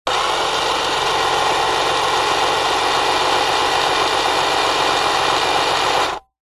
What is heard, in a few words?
science fiction robot small tracks wheels motor whirr spin